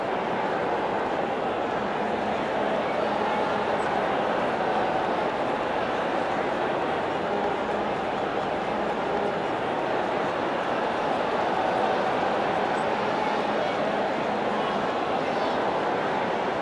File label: Colosseoum People Cheer; FlyForInsanity